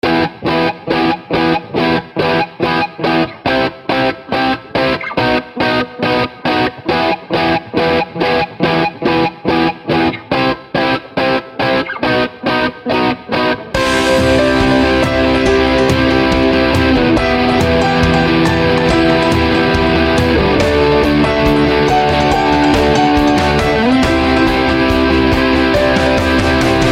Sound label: Grand dramatic punk